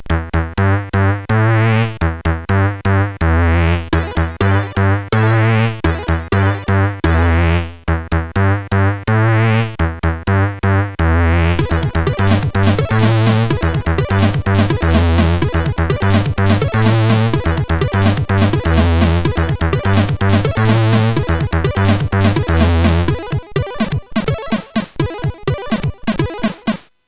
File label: Music, CSG8580R5